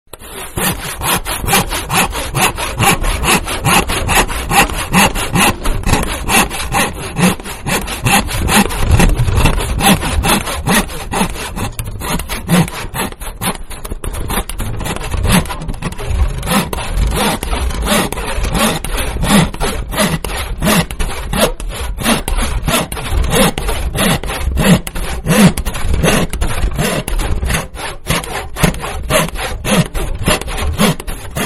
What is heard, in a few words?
Wood Saw Big Sawing Wood; Manhattan Production Music